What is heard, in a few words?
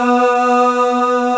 Male Ahz; 90s Sample CDs; Club-50 - Foundations Roland